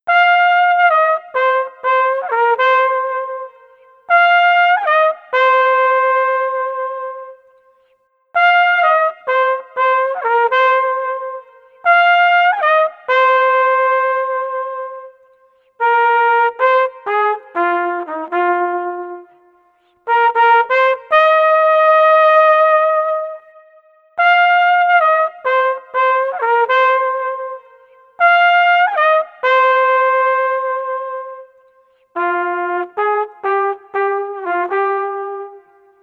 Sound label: Street Flugel Sweet Melody 120